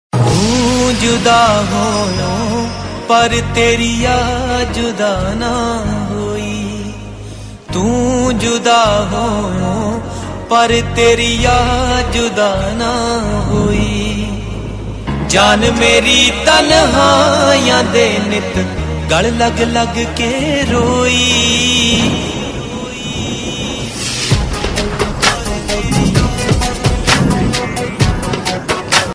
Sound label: Sad Tone